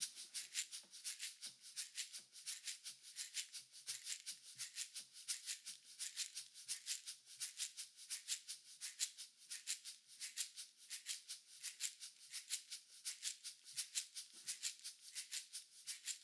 170bpm Shakers pan left

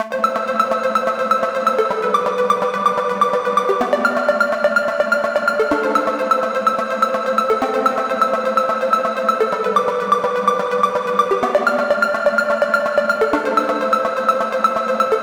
C High Arp Wet 126 A

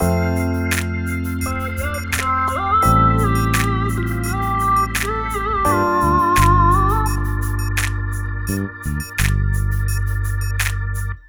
Gospel F 85bpm Full Mix

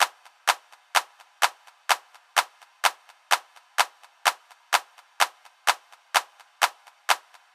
CLAP

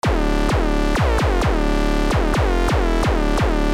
DRUMS Hit hard

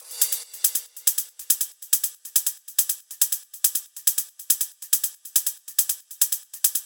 140 Hat Loop

